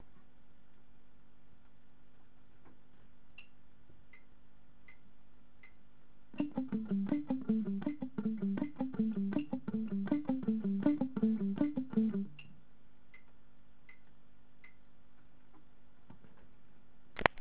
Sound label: alternate picking